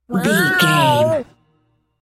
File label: cat scream